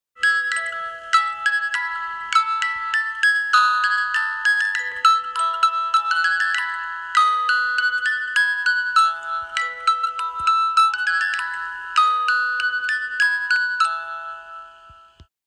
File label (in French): Carillons